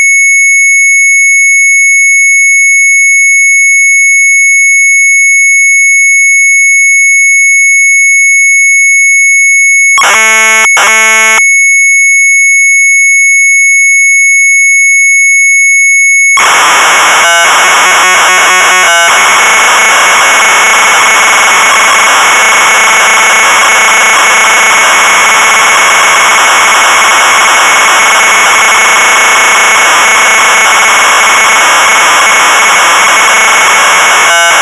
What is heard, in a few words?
Sharp MZ800 Tape; MIJNENVELD; retromelsarchive